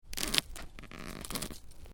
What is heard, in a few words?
Ice: creak of ice under pressure; Snow; Weather; Snow Sound Effects; Stuart Duffield